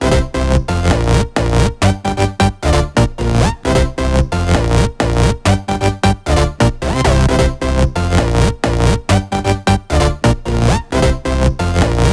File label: 132BPM Melody; Vengeance Samples; Commerce Kit